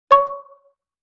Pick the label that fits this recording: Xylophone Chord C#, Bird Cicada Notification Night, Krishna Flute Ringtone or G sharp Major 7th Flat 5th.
Xylophone Chord C#